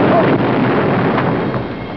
EXPLODE

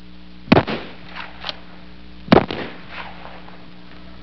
RIFLE

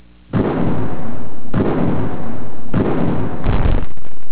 gren

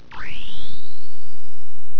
BEEP

